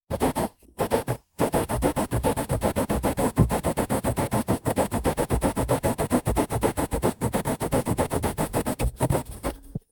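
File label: asmr a quill pen scratching; parchment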